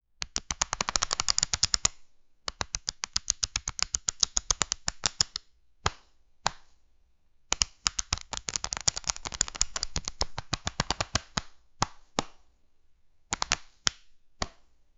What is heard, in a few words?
poker card distribution